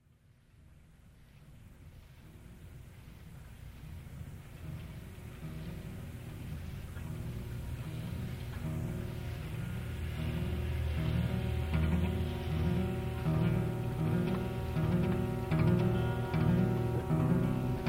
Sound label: rain song